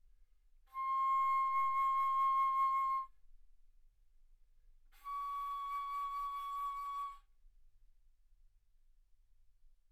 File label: Bass Flute ff C6 Db6